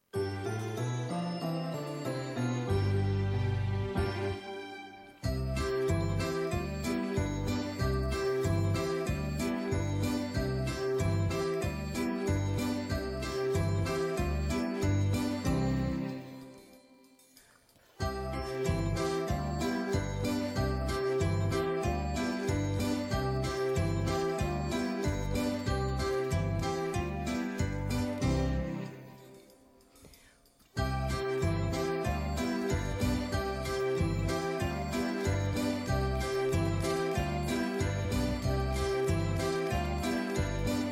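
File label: backing track; pesenka dlya malyshei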